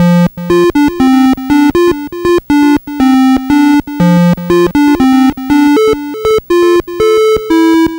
Atari 2600 Synth FX

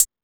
Techno, EMU XL-7

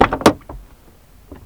LOADING, Transportation Doors Hoods Brakes

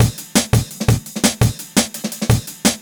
Live Room Drums 170

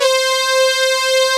PROPH LD; Pro-Rec - Synthsonic; TRINITY

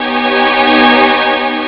string; ST-XX Amiga Soundtracker Sample Packs

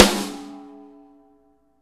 SN AMBIENT, Real Mega Drums

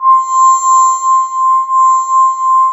SYN, Drum & Bass, 160 BPM; Ueberschall; Drum & Bass Flash Trackz

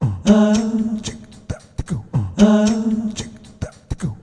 VOX, Roots Disco; USB Soundscan vol.32; AKAI

